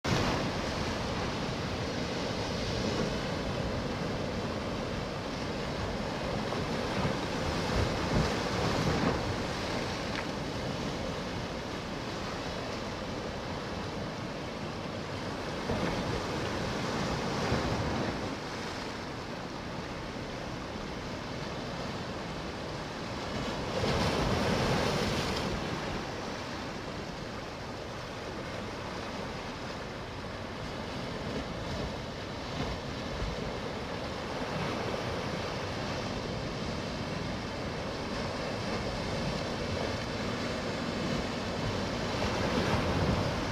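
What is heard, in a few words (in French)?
mer île st honorat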